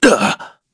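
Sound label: Damage, Korean; heroes; Shakmeh; kings raid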